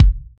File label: bassy kick drum one shot f sharp key, Royality free kick drum sound tuned to the F# note